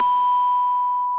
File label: Glocken, b5; BGM; Nintendo; July 2020 Gigaleak